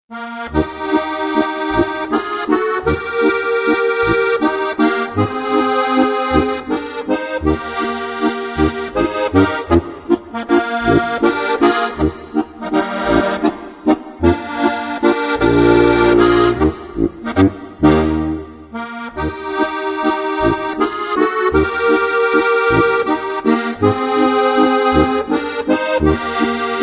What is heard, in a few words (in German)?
walzer